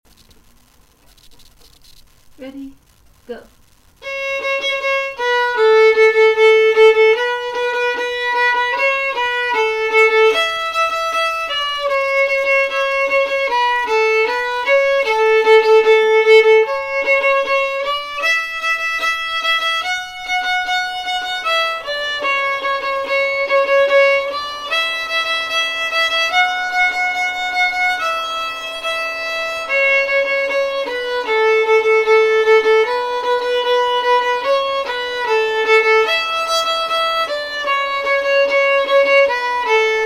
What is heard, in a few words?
shuffle, violin; Aunt Rhody